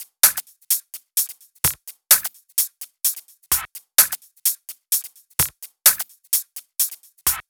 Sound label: Freak Drums 2, 128BPM; Vengeance Samples; Freak Kit